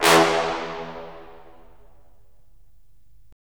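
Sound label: brass; Roland - LA Composer vol. 3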